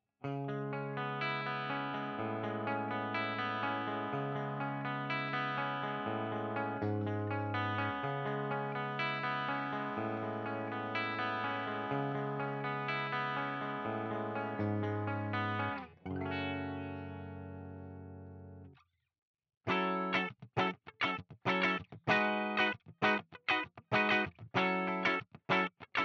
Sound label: Epiphone ES335 CLEAN bridge